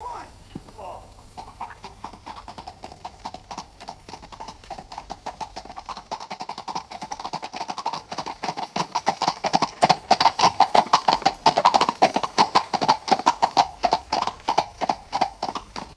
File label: horse trotting on stone surface; footsteps